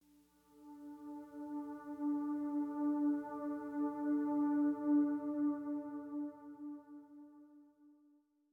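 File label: Music Electronic 96bpm Intro; Homespace; Forza Motorsport 6 Apex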